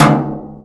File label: metal box impact hard; physics